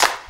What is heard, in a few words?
Hop Clap; Zero-G; Soundclash